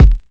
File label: clear kick single hit f sharp key; kick drum samples